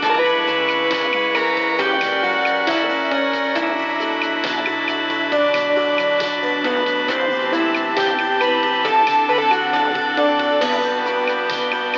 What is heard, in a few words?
elevator music; Angry Goy II